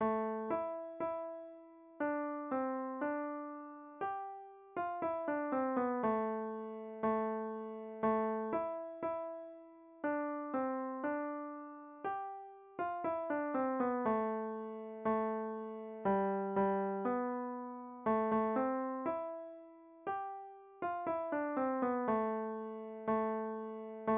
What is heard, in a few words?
pretty little horses beginner piano